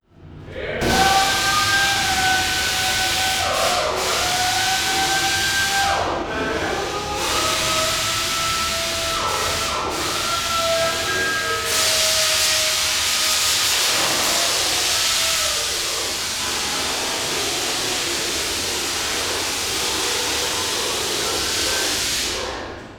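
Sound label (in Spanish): industria, Contaminación Acústica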